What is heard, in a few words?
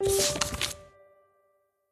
wood tile flip